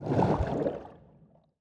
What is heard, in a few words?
spin end; netent